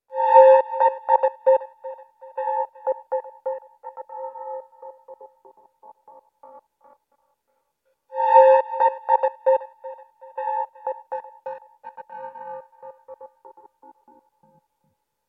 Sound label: 120 bpm spooky